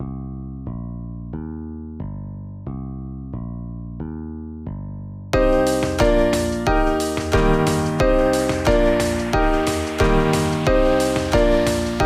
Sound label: Silly Song (Instrumental); Friday Night Funkin 30 Minute Song Challenge